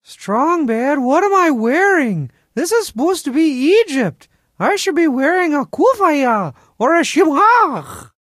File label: Strong Sad; SBCG Ep4; Egypt